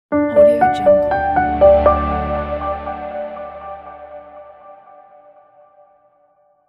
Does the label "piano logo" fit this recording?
Yes